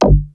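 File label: bass drum electronic fx; 300 Drum Machines; Yamaha Motif ES-Series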